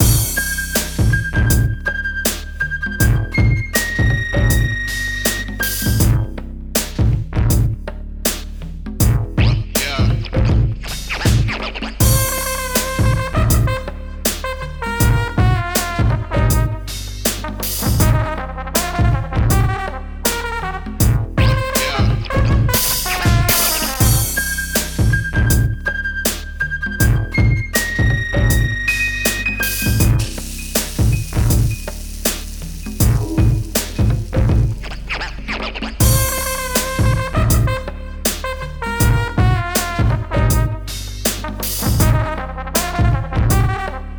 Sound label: latin jazz